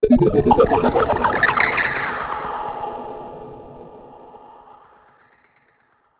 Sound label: ringer; Ringtones